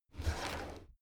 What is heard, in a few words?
drawer cabinet crammed open regular halfway